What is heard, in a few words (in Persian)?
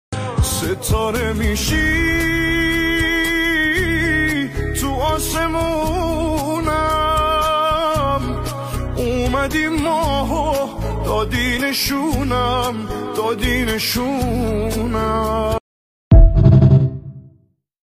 music, آهنگ کوتاه غمگین ایرانی